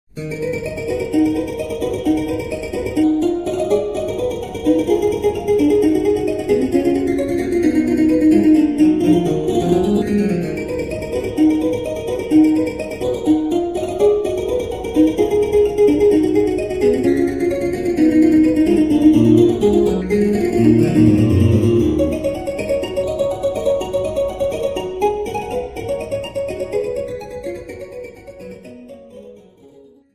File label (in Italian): clavicordo